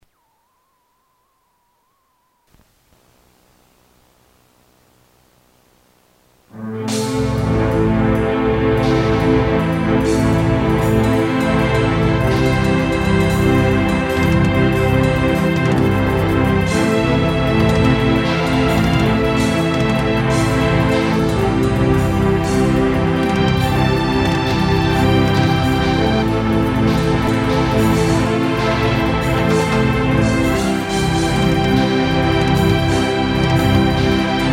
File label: Strings